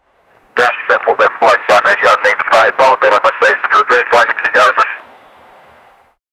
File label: taser deployed; lapd; law enforcement